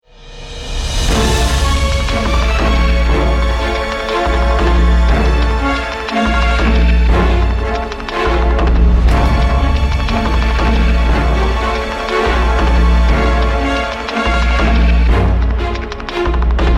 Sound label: start action, music